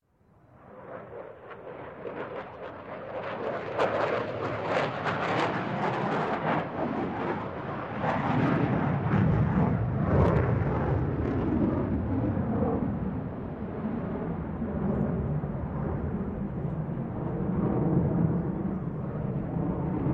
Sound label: SR71A Blackbird Pass Overhead; Sound Ideas; Series 2000